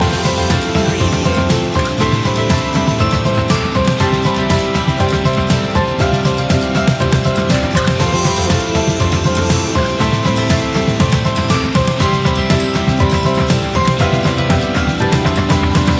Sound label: a warm welcome loop